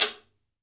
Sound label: GUIRO